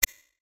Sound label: NAVIGATE